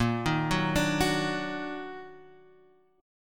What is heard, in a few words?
D sharp Minor Major 7th A sharp